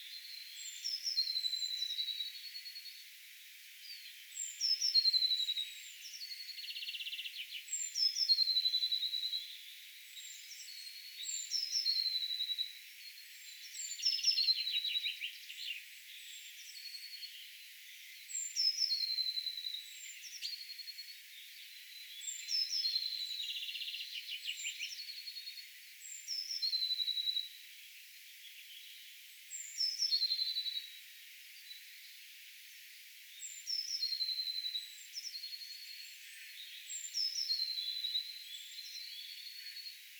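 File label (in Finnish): kaksi sinitiaista vastailee toisilleen laulavat melkein samaa säetyyppiä; kaksi sinitiaista laulaa, ne vastailevat toisilleen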